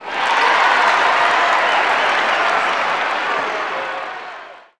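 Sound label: Basketball Crowd Cheer; Crowds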